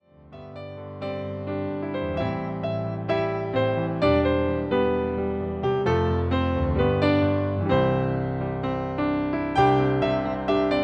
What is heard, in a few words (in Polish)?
instrumental z linia